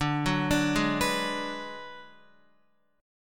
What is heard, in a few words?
D 6th Flat 5th, Guitar; D6b5 arpeggiated; x,5,6,7,8,7; JGuitar